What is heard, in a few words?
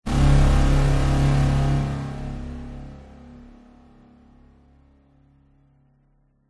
sound design aggressive synth buzz